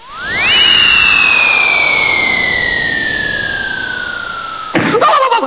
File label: rocket